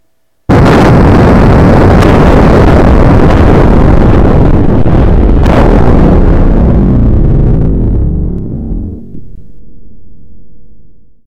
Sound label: Atomic Bomb; Sound Explorer